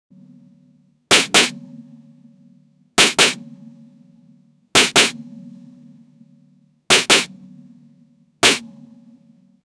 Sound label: jhapat marne wala sound, slap